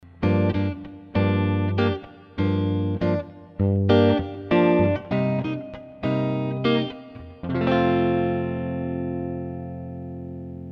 eterna line neck

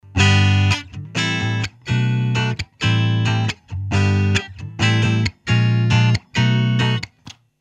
eterna rythm

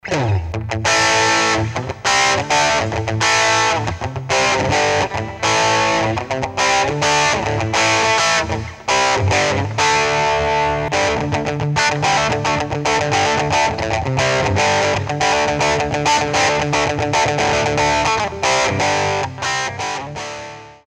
eterna vox overdrive

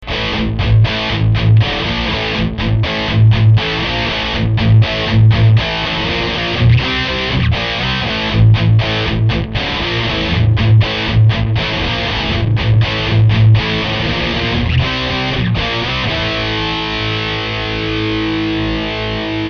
xtc max gain

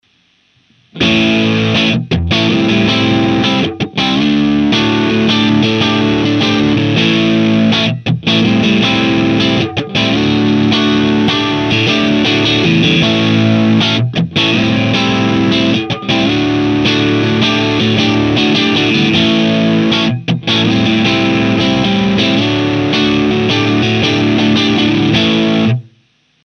od

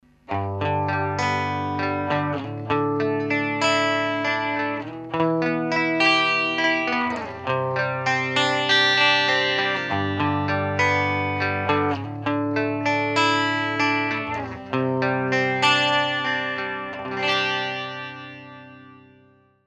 eterna vox invert, guitars

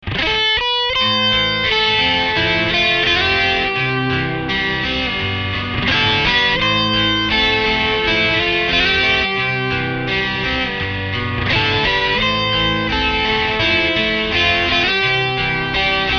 xtc min gain

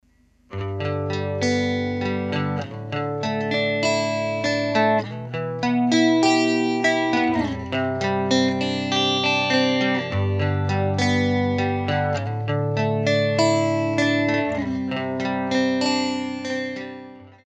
eterna vox bridge

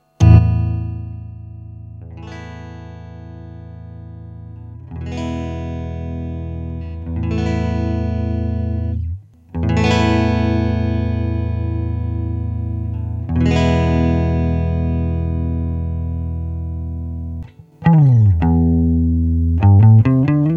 eterna vox neck

